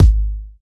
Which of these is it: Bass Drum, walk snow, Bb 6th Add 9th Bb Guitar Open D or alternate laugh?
Bass Drum